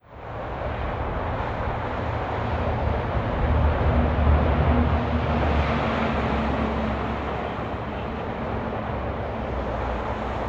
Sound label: Traffic